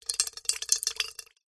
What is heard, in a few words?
nature water drips short fast metal